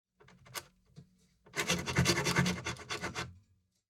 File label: ZITHER General Movement; Twisted Zither